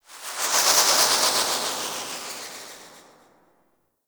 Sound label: gas city distant wind textures; Warframe; Corpus Gas City; Ambience